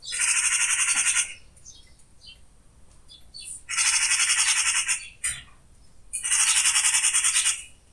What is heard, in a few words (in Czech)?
Straka Obecna